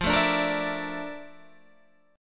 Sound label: A sharp Major Sharp 11th, F